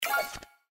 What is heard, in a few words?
pick Card; SLOTS; Chinese New Year Deluxe